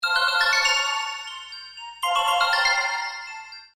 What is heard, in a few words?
Ring Tone; Sony Ericsson; P900